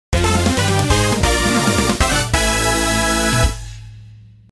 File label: 1st place; mario kart world tour